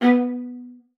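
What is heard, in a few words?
B3, viola spiccato; Xsample VOL-5; 90 s Sample CDs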